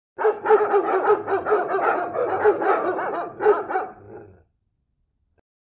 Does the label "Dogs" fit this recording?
Yes